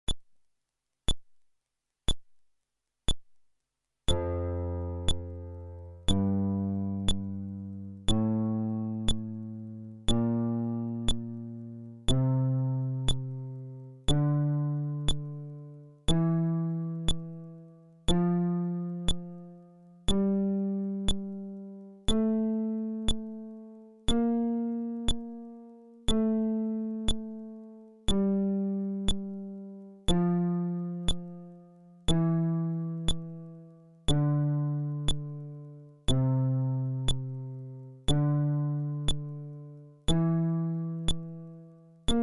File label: Scale, fretless bass